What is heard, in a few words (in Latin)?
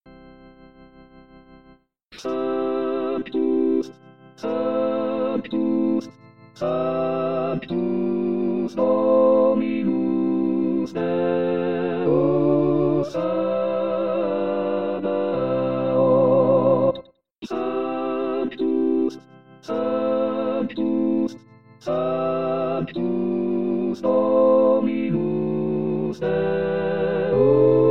Missa Brevis Sanctus Coro; Gounod; messe